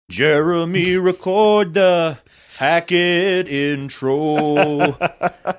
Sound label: singing intro